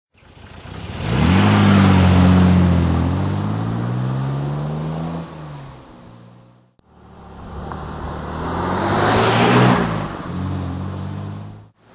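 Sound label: mg mgc driveby, engine sound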